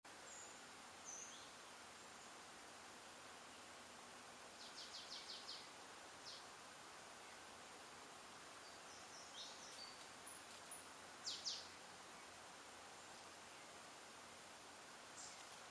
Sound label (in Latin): Poecile palustris; SETE